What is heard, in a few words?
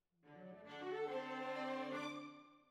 Violas Over